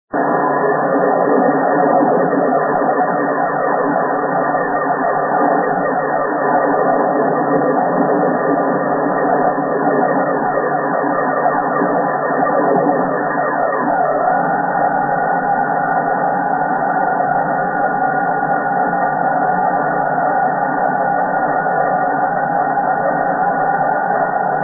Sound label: 28 MHz SSB tune